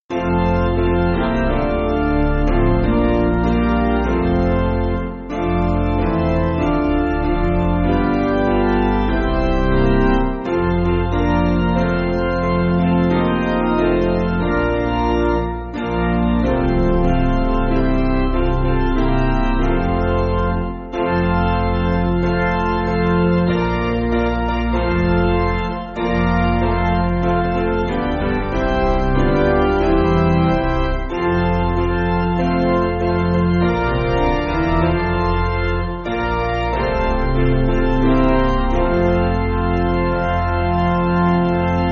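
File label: Piano, church backing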